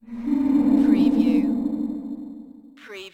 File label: GHOST LAUGH REVERB